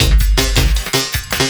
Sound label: LOOP, Drum & Bass, 170 BPM; Ueberschall; Drum & Bass Flash Trackz